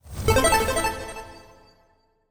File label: Checkpoint Activated